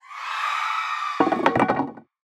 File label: False Maiden Death